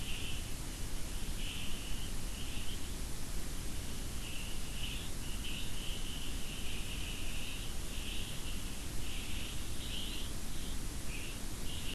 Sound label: WFR audio emfisis